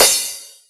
Crash, Club Techno Percussion Cymbal; Zero-G

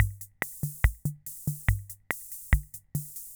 Keynote MR-101 Auto Rhythm Loop; Keynote MR-101 Auto Rhythm Sample Pack

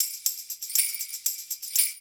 120 PERC; Best Service Advanced Media Trax Modern Composer

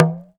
TALK DRM, Heart of Africa; Spectrasonics

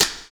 VINYL SD, Drums Dance & Groove

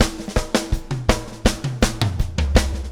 BUGA, BUGABOO

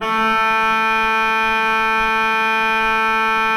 A3, Cathedral Organ, HOLZPRINZIP; Propeller Island

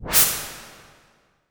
IMP WIPE, Cinematic Impact

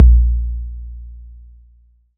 XV Kick, Roland XV-5080

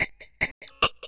HH LOOP; USB Soundscan vol.27

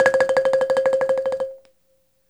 BALAFON

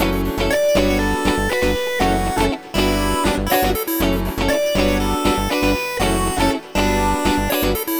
Backing, 120bpm D, Nu Disco; Zero-G; Happy People

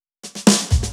Fills 128BPM, Clubsounds

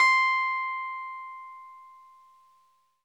CLAVINET C6; Big Fish Audio - First Call Vintage Keyboards

